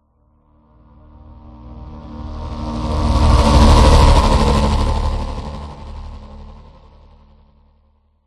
Passby Designed Whoosh Futuristic Scifi Ripper Racer Glider Distorted Saturated; PMSFX